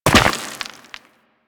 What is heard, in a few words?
Land Soft Rock, Footsteps; Warframe; Operator